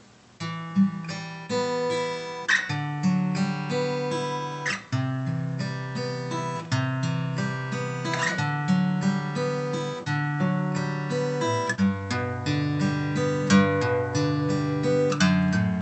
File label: fingerstyle L 00